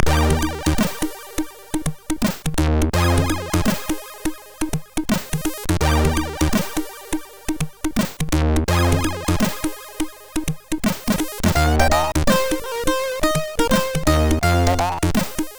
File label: Shuffle, sid MOS6581R3